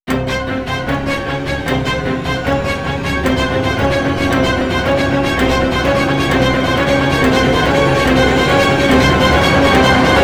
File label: JINGLE - Drowning; sonic before the sequel